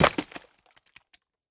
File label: spit, alien